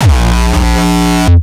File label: kick; shock. Ultimate Kicks vol. 2